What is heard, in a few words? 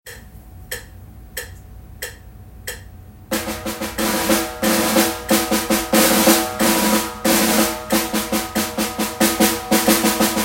Snare; Middle Schools